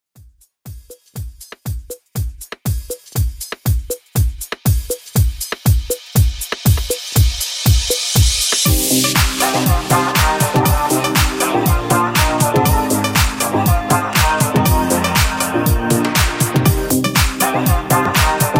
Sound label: Extended House 120Bpm